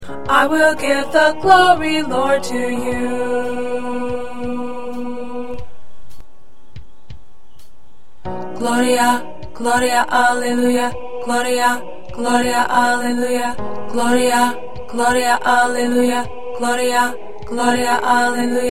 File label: Gloria Alto